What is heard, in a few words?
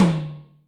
Ind Tom L, Yamaha DTXpress Electric Tom; drum machine